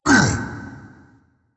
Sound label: grunt, firegiant; smite